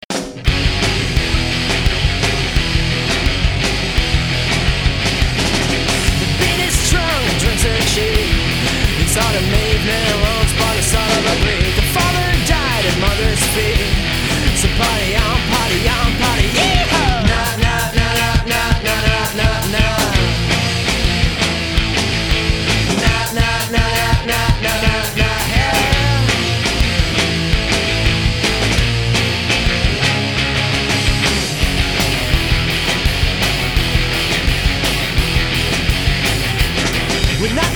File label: party yay